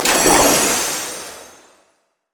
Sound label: surging tides; LoL; nami